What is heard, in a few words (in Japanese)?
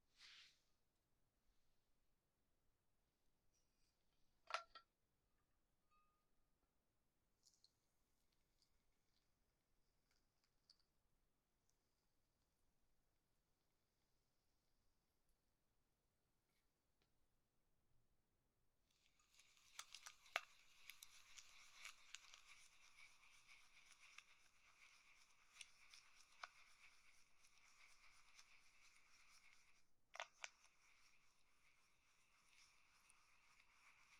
泡洗浄と拭き取り